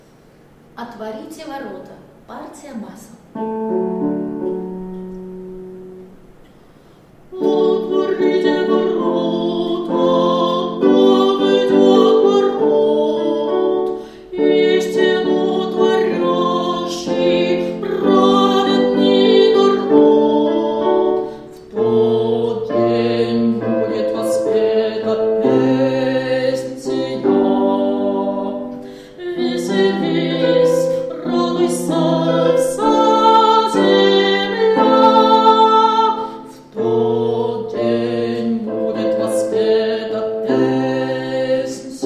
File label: bas; epiphany; otvorite vorota